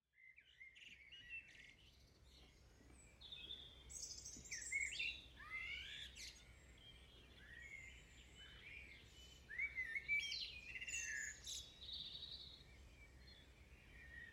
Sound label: ambiance